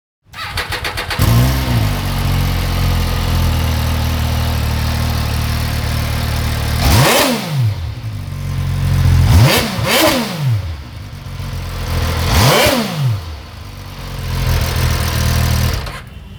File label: Mv agusta f3